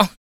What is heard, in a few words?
KUK, MALE PERC; Spectrasonics Vocal Planet; Jazz FX